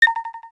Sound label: count end, Samsung phone system; SGH-T369 Linx